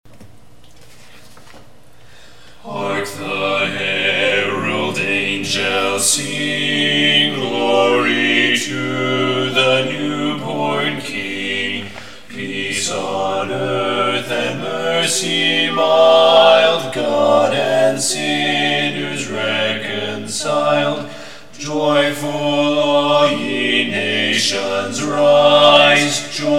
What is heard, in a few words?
hark the herald angels sing bass